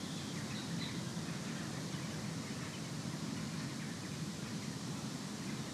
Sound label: Ambi Loop, outdoor samples Ambience Loops